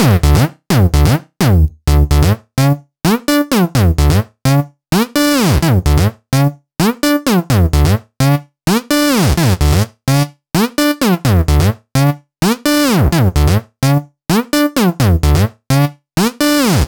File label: Bass, 128 BPM; Rap; Vengeance Samples; Vocal-Hook Kits; Song Kit